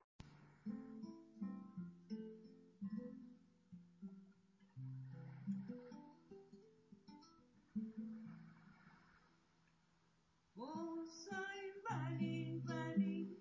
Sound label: chant; osain